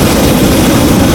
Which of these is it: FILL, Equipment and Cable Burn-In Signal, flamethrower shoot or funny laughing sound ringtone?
flamethrower shoot